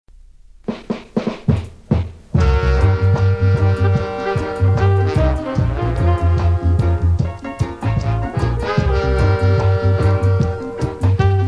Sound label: King Sax